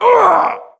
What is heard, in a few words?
Horseman Wound Crit; svencoop; keenhalloween; horsemen